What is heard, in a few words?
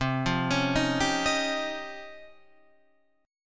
C sharp Major Sharp 11th C